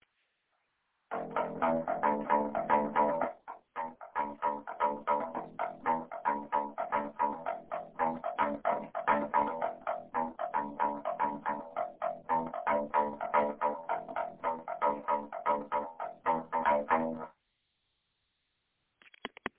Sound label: bass like